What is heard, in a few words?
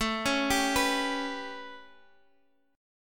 A 9th, Guitar Half Step Down